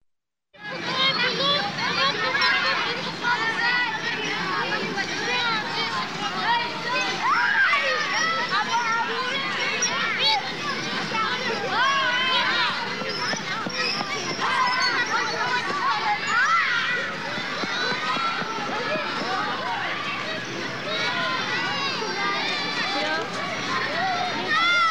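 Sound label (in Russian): французские дети на игровой площадке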